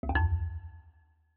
click wrong answer

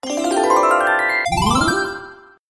game win

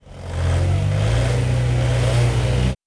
bus

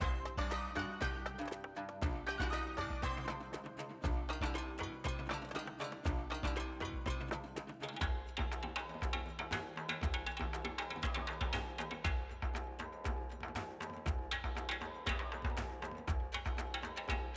music cheerful; bgm

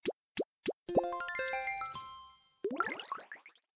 game win animation

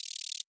beetle

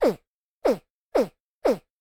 alligator hatchling

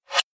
pop up

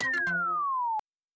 wrong answer

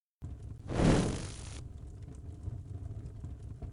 fire ball pop up spin

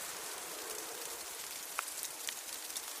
snowfall weather

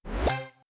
game start

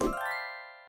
right answer effect

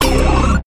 wrong answer

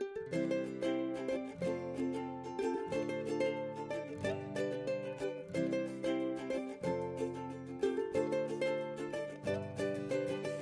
music cheerful; bgm

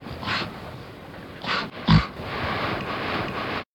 turtle

